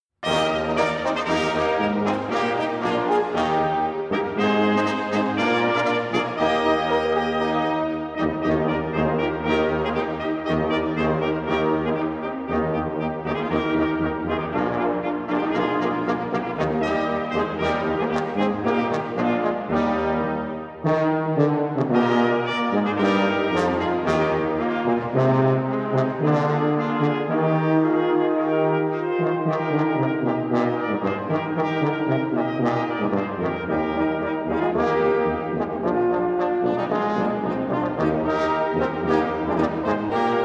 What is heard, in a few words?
sextet, brass